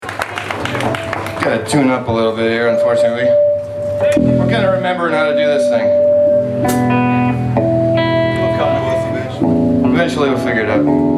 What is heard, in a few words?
Tuning; Jabberwocky